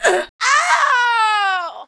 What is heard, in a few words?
Death; TR3